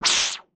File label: aggro